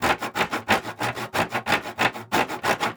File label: Saw Wood Loop, Crafting; Survival Game Sounds - Lite Edition